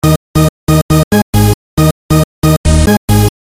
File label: Loop